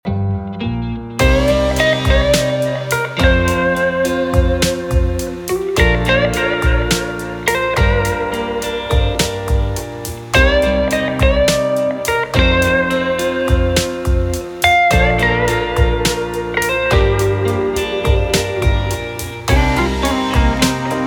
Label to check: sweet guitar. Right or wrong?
Right